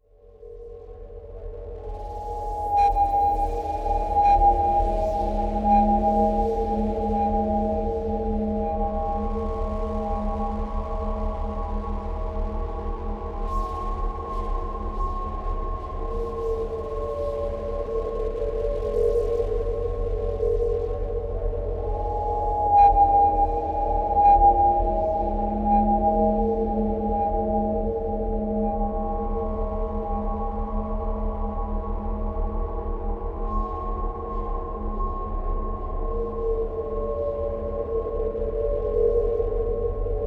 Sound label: midi server crash